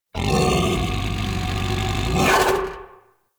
Battle Dog Enemy Spotted; Animals; Vocals

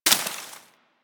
Bullet Impact Debris Grass; Warframe; Weapons

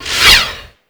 Space Pod Rocket By; Grineer; Vehicles; Warframe

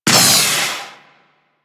Proc Radiation; Mods

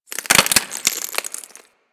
Ocean Glass Crack Small; Warframe; Grineer Ocean

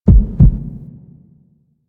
Heartbeat; Fight Lotus; Warframe; The New War; Throne Room

